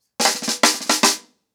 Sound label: Snare 110BPM, ACOUSTIC DRUMS; Sonokinetic - The Roots Drumloops